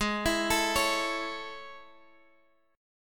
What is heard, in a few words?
A Major Ab; A/Ab arpeggiated